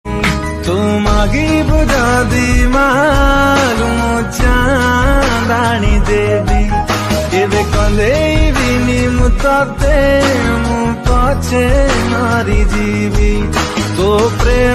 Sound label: Odia Ringtone